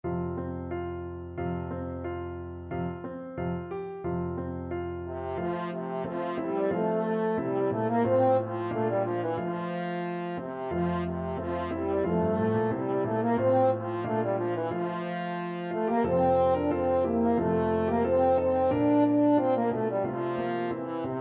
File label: french horn